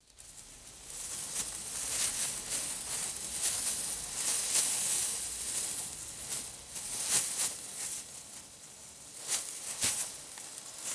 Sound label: continuous rustling of tall dry grass; vegetation